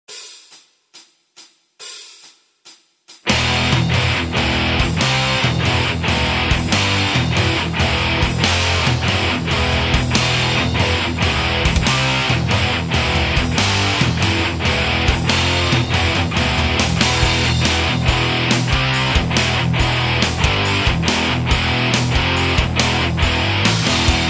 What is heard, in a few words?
metal guitar scales backing track